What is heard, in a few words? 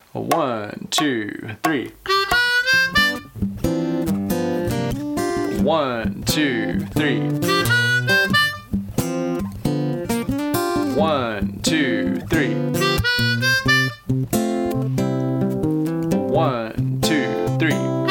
Simple Blues Lick Ascending with Backing Track, harmonica